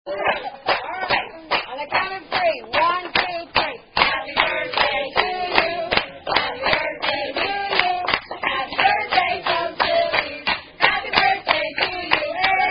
Singing Happy Birthday! [Happy Birthday (Standard) Sung By Wait Staff]; Chilis